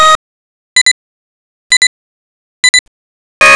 timer; tfc; timerbag

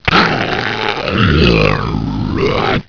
pain, zombie

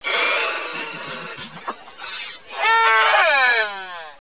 raptor die fight

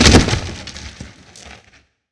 wood crash; svencoop

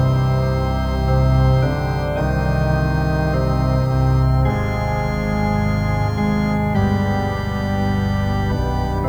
organ; tfc

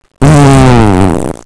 fart; tfc

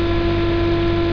cpu FAN

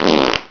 pups

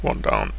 one down, deathpain; dod

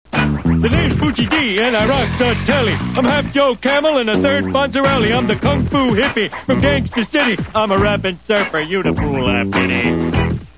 rap

